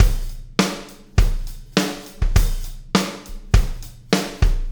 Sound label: Beat, Loops; Sonic Foundry; Not Just Another Pretty Bass; AI Loops - Siggi Baldursson